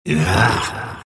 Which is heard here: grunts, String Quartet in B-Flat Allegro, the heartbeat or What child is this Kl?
grunts